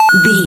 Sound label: retro collect pickup coin